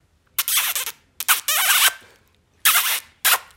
Kissing Sound

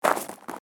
Left Gravel Footstep

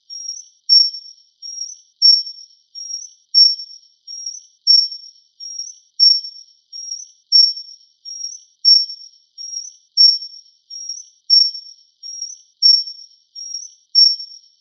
Squeaky Wheel Loop

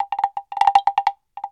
Woodbell Short

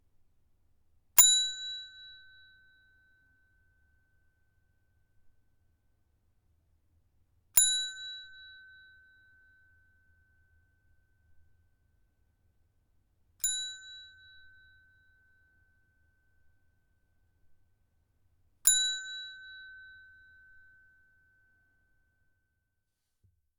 Hotel Bell

